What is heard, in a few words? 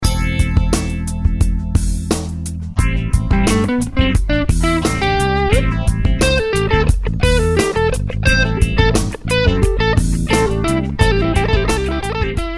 funk; bassist